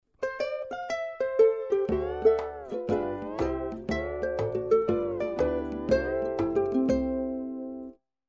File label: Vamp